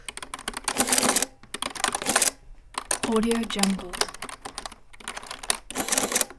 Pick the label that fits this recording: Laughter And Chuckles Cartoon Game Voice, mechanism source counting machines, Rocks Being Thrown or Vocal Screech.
mechanism source counting machines